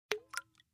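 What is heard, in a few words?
Splash Small Plop Glass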